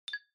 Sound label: Smartphone UI Sound Speaker